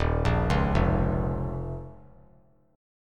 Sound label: Eb 7th Suspended 2nd; 3,5,4,3; Eb7sus2 arpeggiated; JGuitar